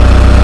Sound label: honda eng; Chase Hollywood Stunt Driver; XBOX